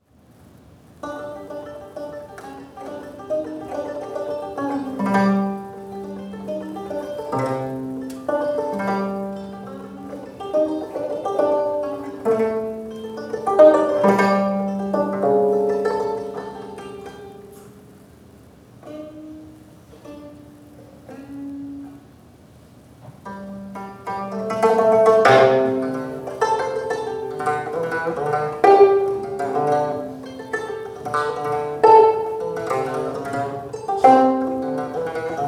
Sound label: gayageum poems